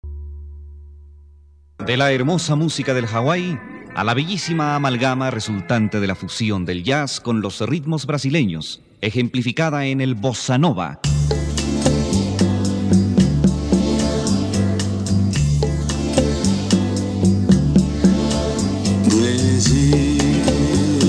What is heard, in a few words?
Bossa Nova